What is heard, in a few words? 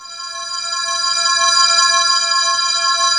cymbels C5